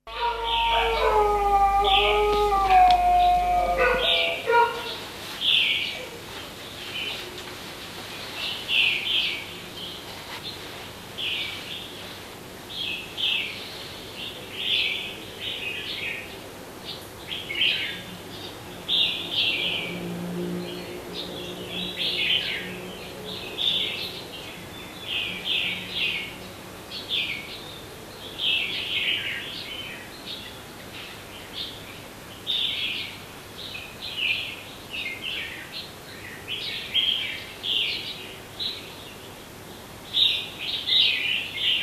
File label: tai birds day, Thailand street recordings